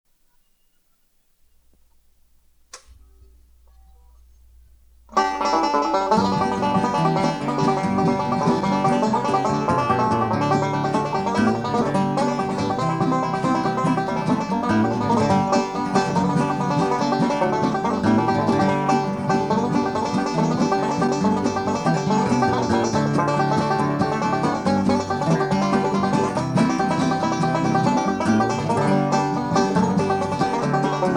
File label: Instrumental